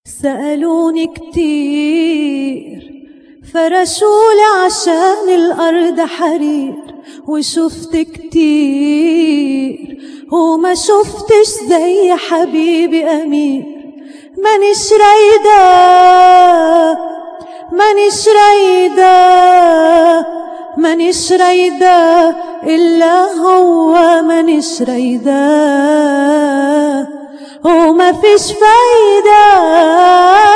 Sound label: solo